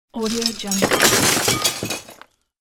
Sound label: throwing shattered glass; fxprosound